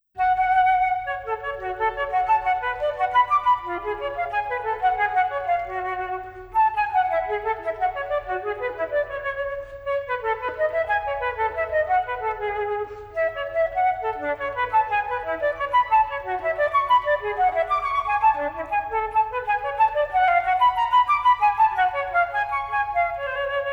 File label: Solo Flute; Telemann; TWV40-11